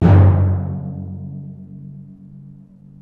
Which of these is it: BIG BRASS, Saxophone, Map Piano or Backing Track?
BIG BRASS